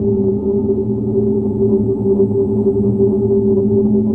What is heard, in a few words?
inside hum; Dystopia; vaccine